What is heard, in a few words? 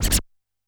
F#5 Scratch Push, Yamaha PSR-E323; Analog Kit